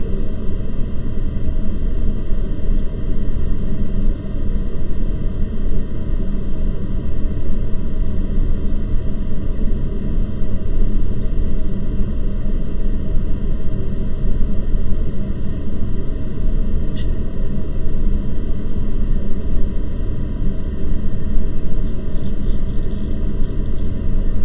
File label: PACK, Aircraft A320